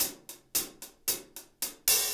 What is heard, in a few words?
Top Ends, Beats; Zero-G; Monster Beats